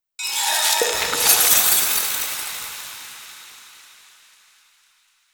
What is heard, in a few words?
Frozen Rose